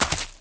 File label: step grass; people; Pirates Of The Caribbean